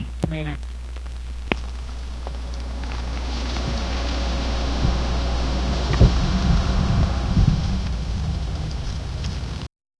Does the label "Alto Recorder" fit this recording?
No